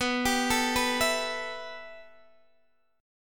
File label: B 7th Suspended 4th Sharp 5th, Guitar, DADGAD